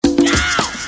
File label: STONE VOX